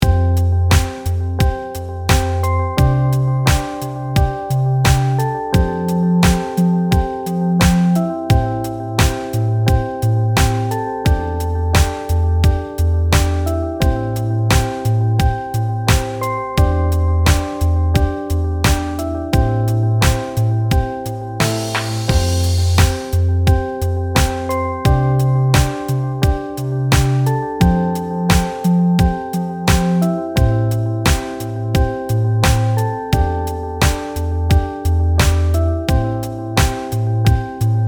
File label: steel drum; bluewave by music sound software